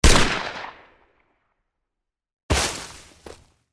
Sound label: Shot fall; Age of Empires III; cinematics